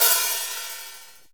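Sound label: hi hat; HH-Kits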